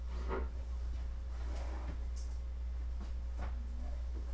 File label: coin